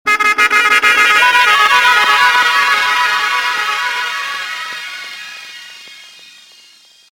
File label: Tripple Horn; DJ Effect